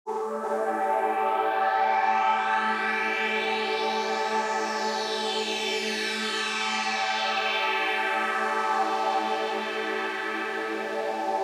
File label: Pad; PatchFactory